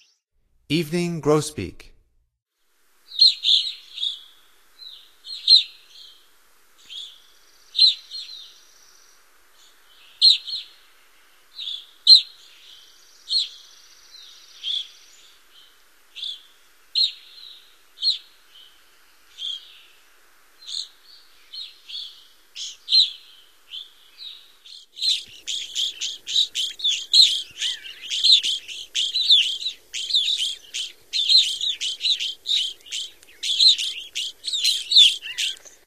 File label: Evening Grosbeak, Songs of Birds of North America; Stokes